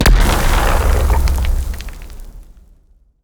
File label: sand trap